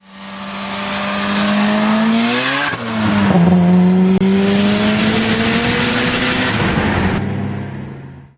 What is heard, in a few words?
Civic Turbo Spooling